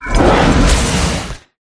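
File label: Rat buff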